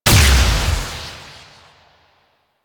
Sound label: Fire Medium; Weapons; Warframe; Duviri; Incarnon Adapters